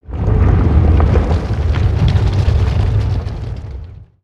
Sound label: Earth Quake Rumble Rock Slide; ninjago